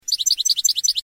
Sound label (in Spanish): floreo tovi tovi, campero